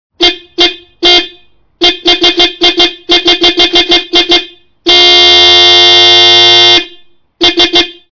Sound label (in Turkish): Araba Kornasi zil sesleri